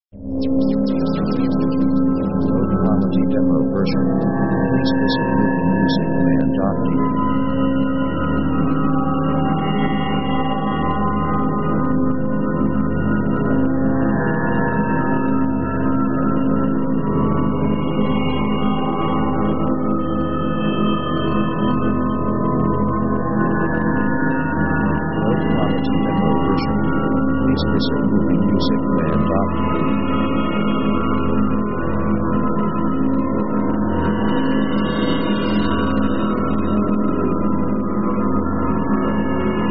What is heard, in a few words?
dystopia scifi; synth themes